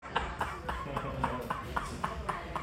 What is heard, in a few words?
two stroke laugh